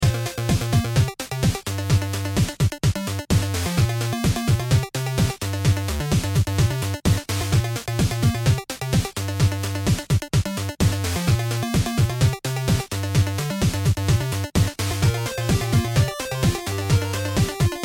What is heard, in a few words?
beat